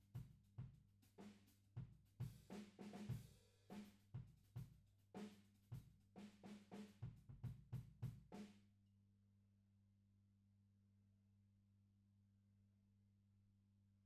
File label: HAMMOND